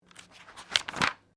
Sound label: Book Page Turn; Book movement; Books (Sound Effects:Offices)